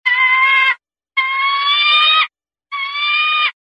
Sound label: falcon calls